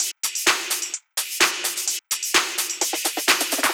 Drum Loop 128BPM FULL ohne BD

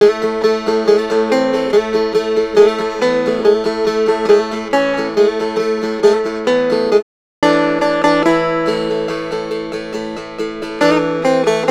Stille Nacht Instrumental

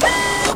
HYDRAU; Best Service; Advanced Mega Effects

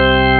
orgel; Amiga Soundtracker sample

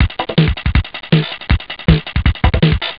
schlagzeug; ST-XX Amiga Soundtracker Sample Packs

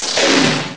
knarr; populous; Amiga Soundtracker Sample Packs

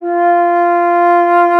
FLOTE, Alpine Volksmusik; Best Service

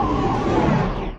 MECHANIK; Best Service; Advanced Mega Effects

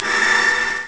Chor; Amiga Soundtracker Sample Packs

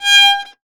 GEIGE; Best Service; Alpine Volksmusik

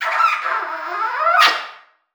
KISS, VIOLA FINGER; Xsample VOL-5

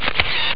fotoapparat; ST-XX Amiga Soundtracker Sample Packs

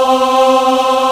Aahh Chor; Best Service Gigapack 1; VOICE

